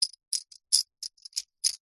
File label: leisure game plastic discs stacking in hand; connect 4